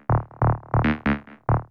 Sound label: bass A; Techno; TechnoMusicMaker